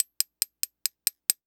Industry Tool Hammer Small Metal Hammer Tapping Nail